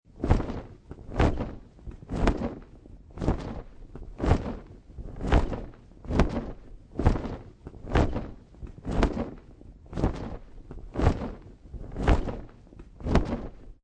Wings Flapping Dinosaur Monster; Dinosaur Sound Effects; Dinosaurs (Sound Effects:Animals)

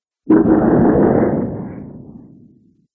Alien Impact; Alien Sound Effects; science fiction sound effects

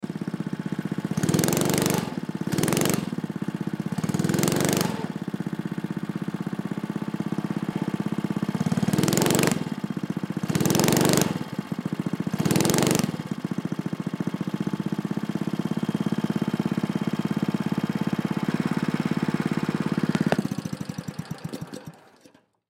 Go Kart Idle Rev; SPORTS; Blastwave

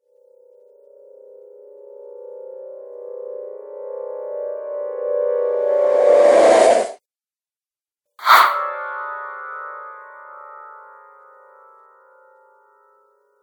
Horror Trailer Whooshes

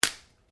Branches Dead Snap

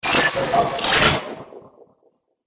Laser Future Droid Repair; Sci-Fi Laser Sound Effect; Laser Sound Sound Effects; An Orignal Sci-Fi Laser Sound Effect

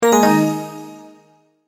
multimedia tone end

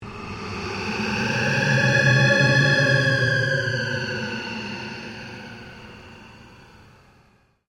PULSE LONG SWEEP

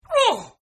Grunt; Grunts; Voice; Partners In Rhyme

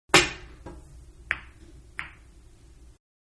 Billiards Stick hitting cue ball Only; Billiards-Stick-Hitting Cue Ball Only Softer; Pool (Sound Effects:Sports)

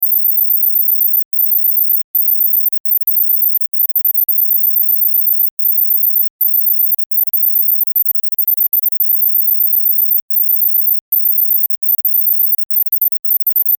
Science Fiction Computer Readout High Pitch Beeps; Computers

